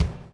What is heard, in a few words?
DOWN, DOWN KIT 78